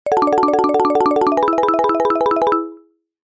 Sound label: multimedia game sound slot machine win or spin mallet tone